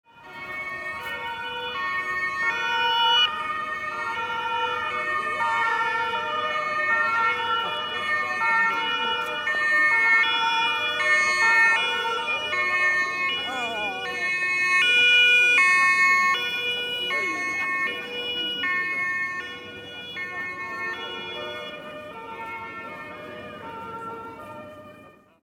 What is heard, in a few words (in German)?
Polizei und RTW city